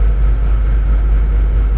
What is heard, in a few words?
fan; Golden Crayon Sounds